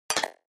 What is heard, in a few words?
Button Triple Elect H, buttons